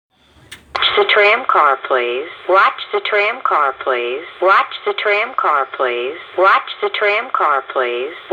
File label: tramcar